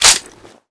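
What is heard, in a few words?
weapon foley beretta 9mm reload clip in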